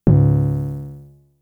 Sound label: FX, Drum Machines; Fricke Schlagzwerg